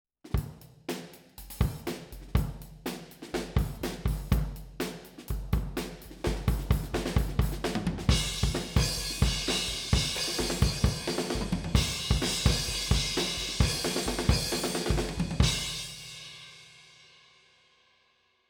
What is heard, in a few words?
Kick, Head On 10cm Outside U47 FET Outside 90cm